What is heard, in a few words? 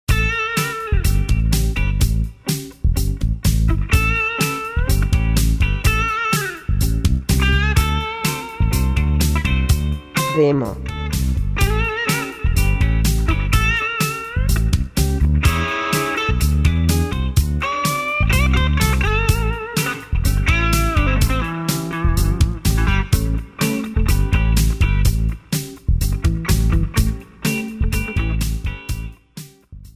Blues